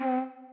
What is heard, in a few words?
bg lead flute c4; gamerip; lofi room demo